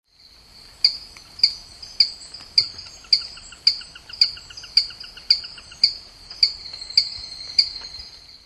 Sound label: Gephyromantis enki